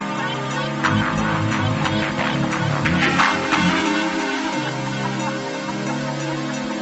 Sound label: melody